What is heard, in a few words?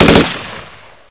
ar1 3 rounds, weapons; hl2